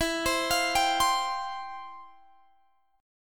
C Suspended 4th E; Csus4/E

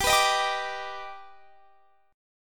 D sharp Augmented Major 7th G, chord

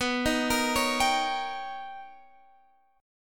B Augmented Major 9th B Guitar DADGAD

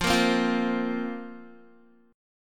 F Suspended 4th F, Guitar; Fsus4 strummed; 12,13,12,10,10,x; JGuitar

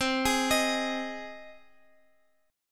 C Minor Sharp 5th C down Guitar Open F; Cm#5 arpeggiated

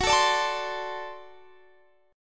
D 7th Gb, guitar

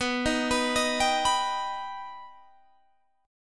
B Augmented B Guitar Open G, chord

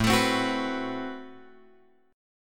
A Minor 6th Add 9th A, Chord; Am6add9 Chord {5 X 7 5 7 7} strummed

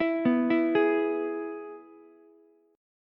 G sharp Minor Sharp 5th, Ukulele; G#m#5 arpeggiated; JGuitar

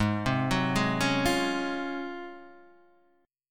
Ab 13th Ab Guitar Standard; arpeggiated; JGuitar

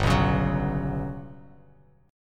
G Major Sharp 11th G, Bass Standard; strummed; JGuitar

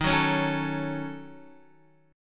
Eb Minor 7th Flat 5th, Guitar; Ebm7b5; strummed; JGuitar

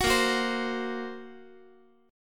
A sharp Suspended 2nd Sharp 5th, Guitar; strummed; x,x,9,8,10,11; 4A,4E,5A,5D,5G#,6C#; JGuitar

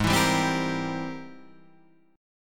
Ab Augmented Major 9th Ab; strummed; x,x,6,9,7,7,9,7; JGuitar

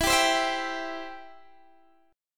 E-Minor Sharp 5th, Guitar, Open D; x,19,17,18,19,17

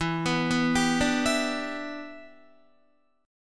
E Minor 7th, Guitar; arpeggiated; 11,14,11,12,14,12; 4F,4A,5C,5G,5C,6E; Em7

